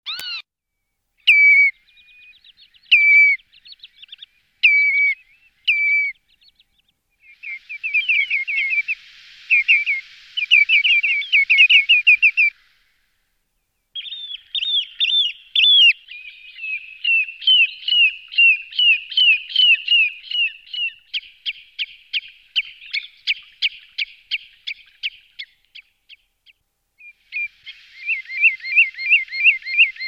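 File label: travnik golos, common redshank voice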